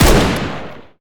fire; Urban-Coalition; Decay; arccw ud

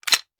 mech, weapons; CopsNRobbers

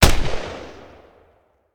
fire, mac10; CopsNRobbers

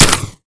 mech; weapons; m1014; Urban-Coalition; Decay; arccw ud

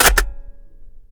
mech; Urban-Coalition; Decay; arccw ud

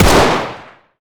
fire, glock; Urban-Coalition; Decay; arccw ud

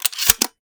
shell insert, 870; weapons; Urban-Coalition; Decay; arccw ud

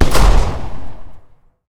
fire dist, glock; weapons; Urban-Coalition; Decay; arccw ud

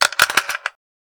struggle, weapons m16; arccw ud

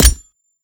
mech last, glock; weapons; Urban-Coalition; Decay; arccw ud

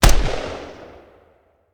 fire, mac10; CopsNRobbers; weapons

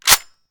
chamber, weapons mini14; Urban-Coalition; Decay; arccw ud

